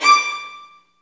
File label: STR VNB2, Vlns Marc; Roland L-CD702; Vlns Marc1-3